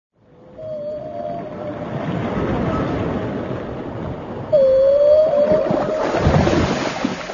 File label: Whale; animali acquatici